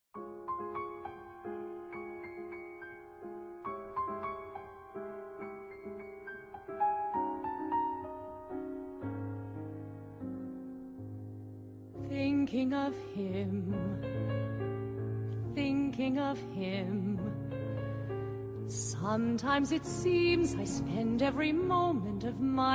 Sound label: Female